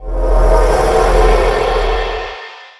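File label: cast skill magic burst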